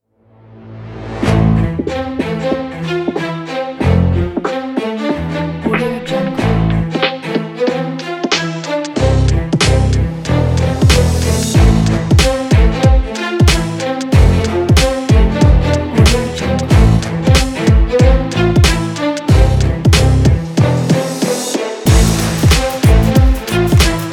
hip hop

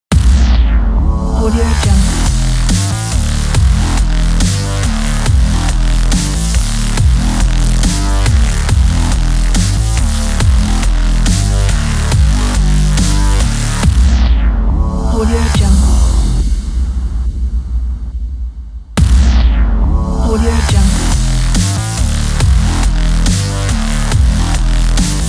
sport ident

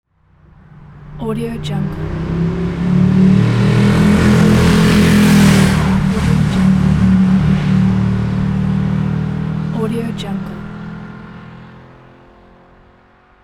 motorcycle

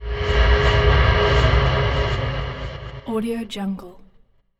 fantasy magic shadow spell; esmteam

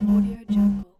text message vibrate

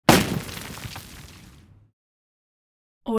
dirt explosion

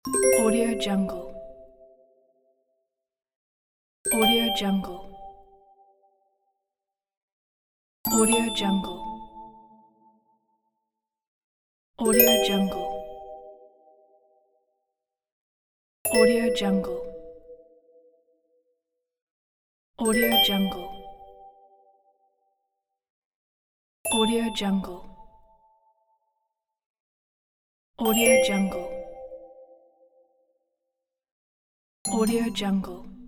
coin alert tones

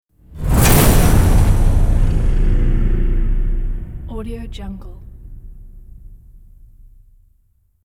cinematic trailer sound effect

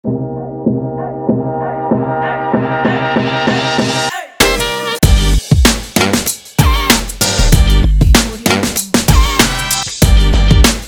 hiphop is hiphop

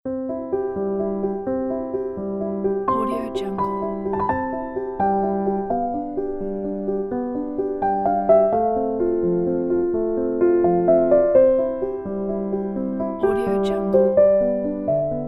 positive soft piano sonata

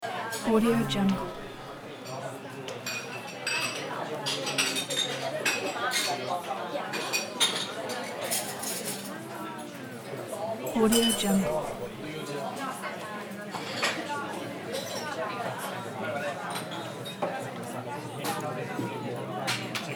busy small bar

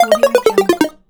retro game down

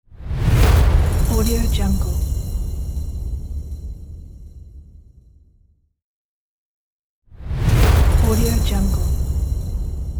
hit; nargomusic

